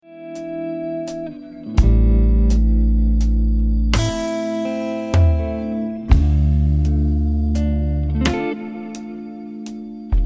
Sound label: 85BPM Am